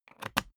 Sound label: briefcase plastic latch lock key insert quick; industrie wirtschaft; bueroarbeit